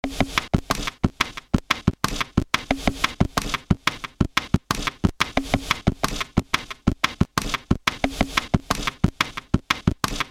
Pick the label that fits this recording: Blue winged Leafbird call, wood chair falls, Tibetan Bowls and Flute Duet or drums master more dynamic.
drums master more dynamic